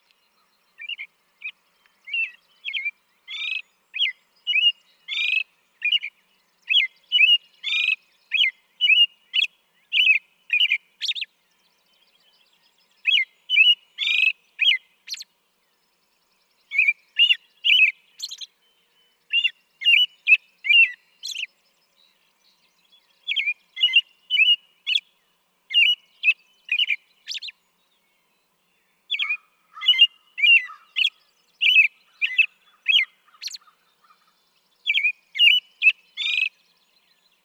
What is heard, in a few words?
American Robin